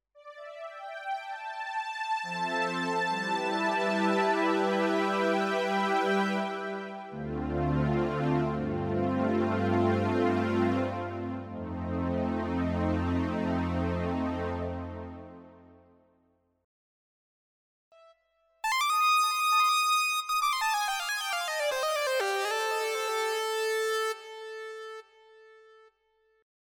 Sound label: VR09 Synth